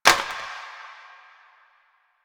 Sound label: Plastic Drop; Ambience; Warframe; Nineteen Ninety Nine